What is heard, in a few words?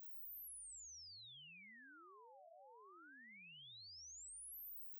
weird